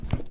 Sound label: Butler Step; lethal company; ambience; windows